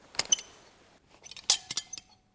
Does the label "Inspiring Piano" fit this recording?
No